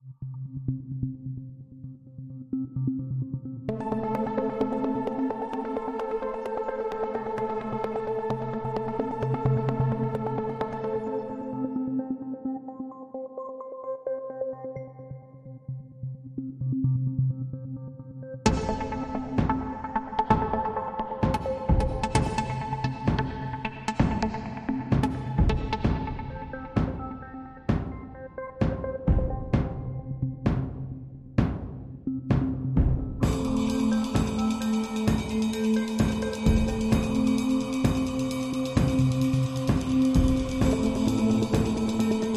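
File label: Drone, Light With Rhythm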